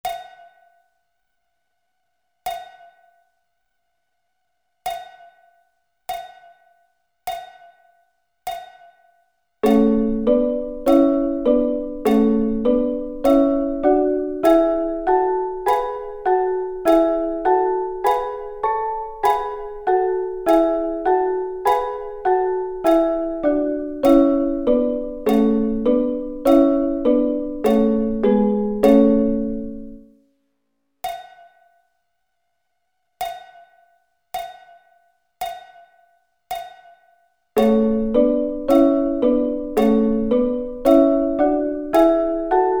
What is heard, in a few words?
A Double Stops